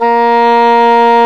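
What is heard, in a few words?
English horn; Sound & Vision - Gigapack I CD 2 (Roland); Brass&Woods1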